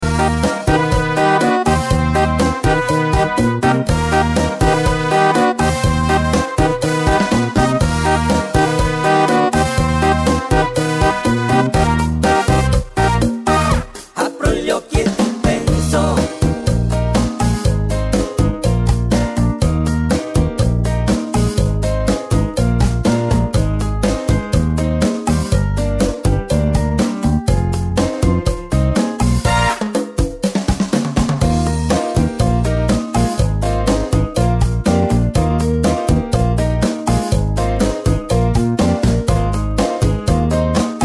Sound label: cha cha